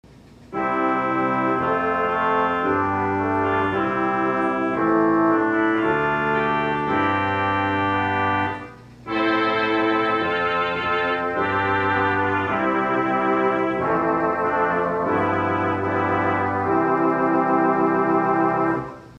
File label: Tuba